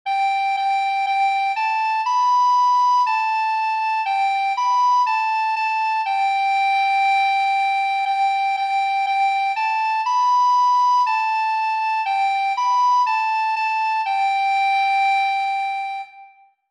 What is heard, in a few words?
three note recorder